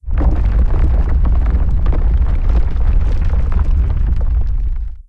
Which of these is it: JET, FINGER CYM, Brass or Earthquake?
Earthquake